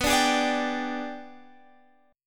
Ab Minor Major 7th Flat 5th

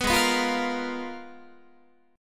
Ab Minor 6th Add 9th, Ab, Guitar; Abm6add9; strummed; 14,16,14,15,14,16

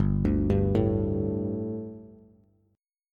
G Suspended 2nd G, down, Bass; Gsus2 arpeggiated; JGuitar

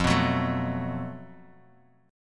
Gb Minor Major 7th Double Flat 5th F

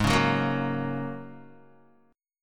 Ab 7th Suspended 2nd Suspended 4th, Guitar; strummed; 3,4,6,3,6,x; 4F,4A,5C,5G,5C,6E

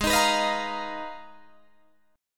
G sharp Minor 6th Add 9th, Guitar DADGAD

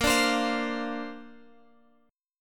Bb Major Add 9th, Bb, down, Guitar, Open G

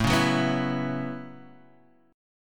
A 6th Add 9th, Guitar, Half Step Down

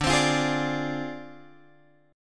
D Minor 7th Flat 5th Guitar Open F; Dm7b5; strummed

